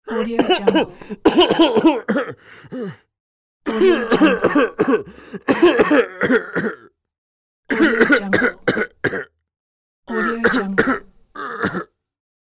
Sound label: old man coughs pack